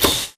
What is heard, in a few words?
control room panel activate; Titanfall 2